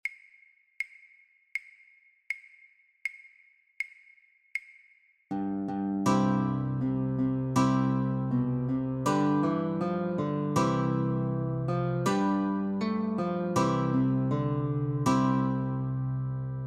The Twelve Days of Christmas - Notes & Cho, guitar